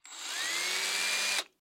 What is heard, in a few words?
drill fast short